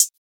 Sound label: HiHats, Closed Hats